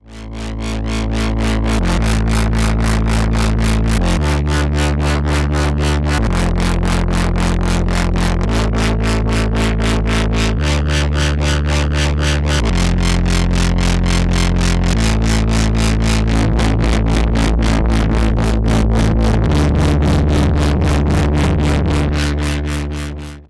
DSIP12M dezimierter Sound Bitcrusher und SR reducer

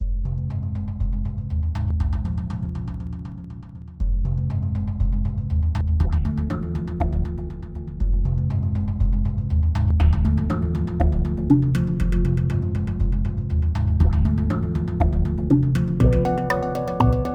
Antelope Discrete 4 Soundbed Unbearbeitet

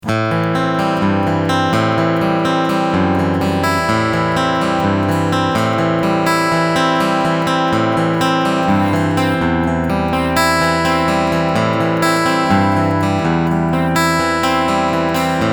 EQ Mitte CoolTube AN

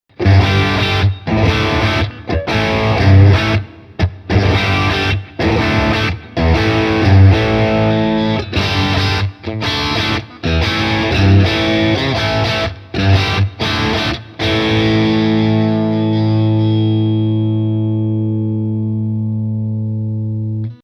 Yamaha Pacifica Standard Plus verzerrt heavy Crunch; StegColisplit

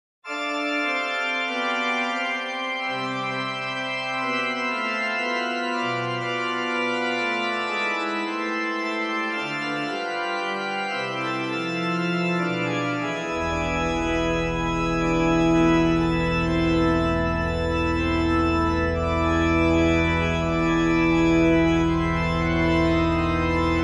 Konzerthaus Orgel

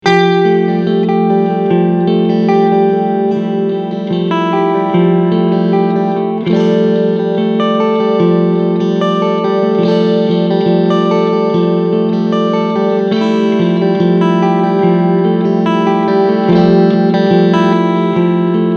Clean beide SC

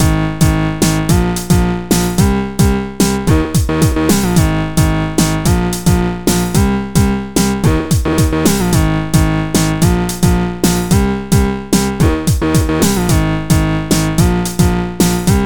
Oszillator Dancehall

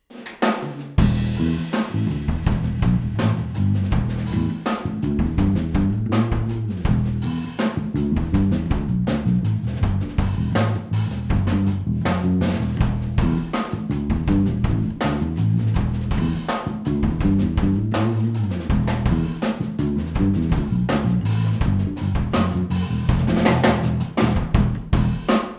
R 07 Drums Bass Raumaufnahme 2m Distanz

